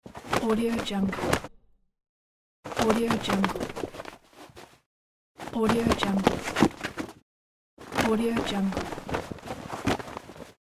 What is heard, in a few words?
leather backpack sounds